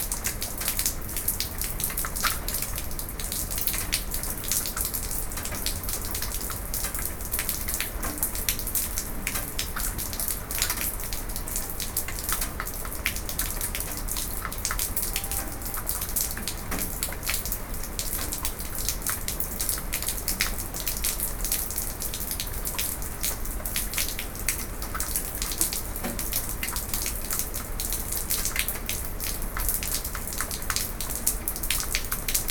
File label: outdoor